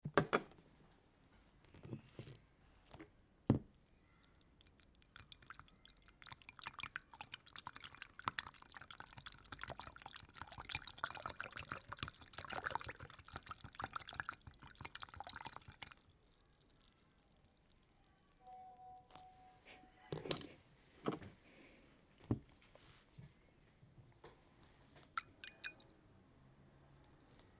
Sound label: Water